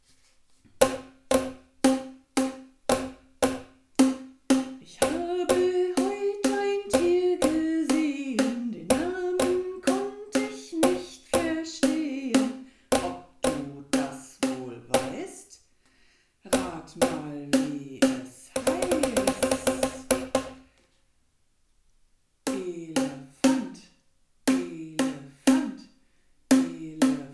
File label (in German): Boomwhacker Tiere